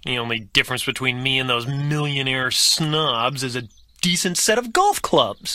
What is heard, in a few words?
alert